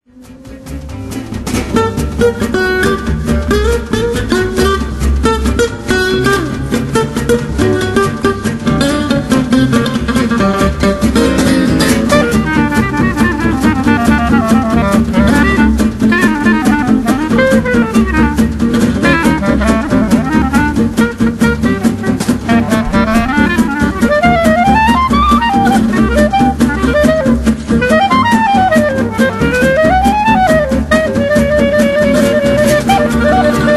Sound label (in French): blues mineur; djangoclub